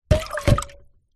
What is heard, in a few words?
household water in metal pan shallow down on surface